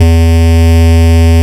more bass E2; LIMAT LSDJ kit; LSDJ Extra Bass Notes

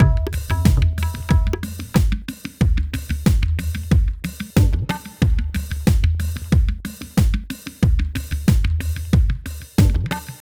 Beat, Hip-Hop

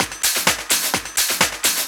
Discohouse Loop 128 BPM

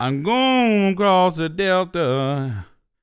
DELTA, BLUES

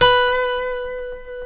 C#, funk guitars

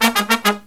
Brass Riffs

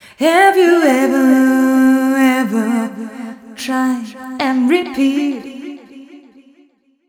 f mel g, fem Vocals

